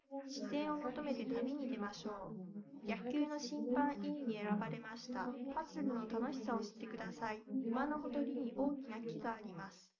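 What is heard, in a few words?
female, live recording 250ms 5cm; SiSEC11; underdetermined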